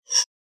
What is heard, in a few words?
Futuristic Weapons Sound Effects